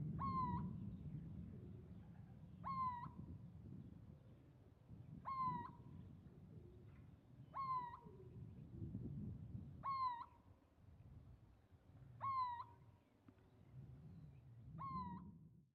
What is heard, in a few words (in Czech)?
hlas zvuky zvirat